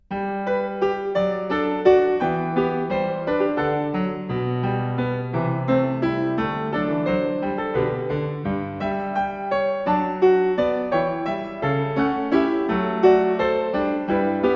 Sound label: Goldberg Variations BWV 988 Variatio 4 a 1 Clav.; KIMIKO ISHIZAKA